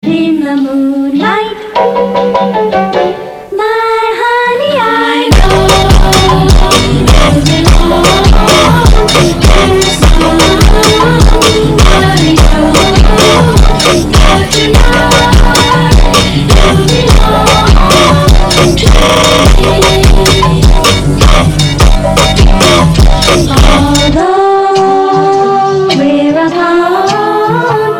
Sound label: FUNK